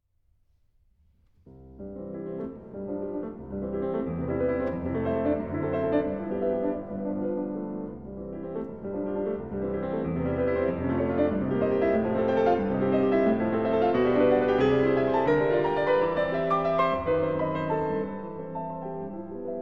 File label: preludes op. 28 nr. 1 c dur agitato klavier; chopin